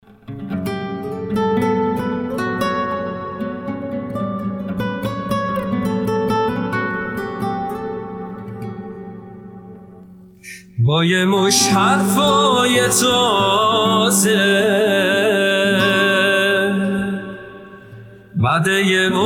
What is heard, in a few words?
Guitar Version